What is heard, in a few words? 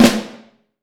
CAFL, Vintage Snare Drum; Q Up Arts; Johnny.C's Vintage Snare Drum; 5.5X14 SLR